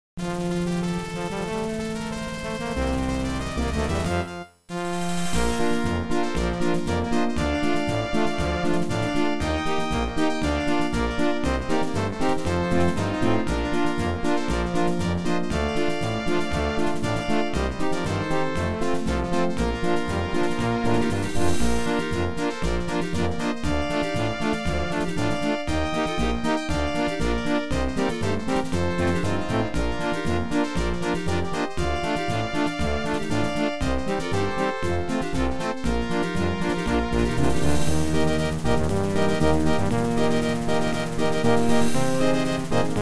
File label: Midi Positive; Calcu-Late